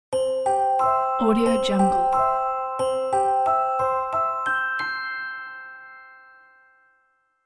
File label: clock